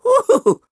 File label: Wohoho; Rupin: "Wohoho!"; Skyward Sword; zelda